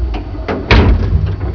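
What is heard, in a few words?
DOOR SLIDE; MOVEMENT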